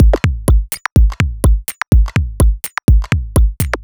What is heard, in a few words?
C 125bpm, House Drumloops; 90 s Sample CDs; Zero-G House Fabrik Drumloops; ZG Drumloops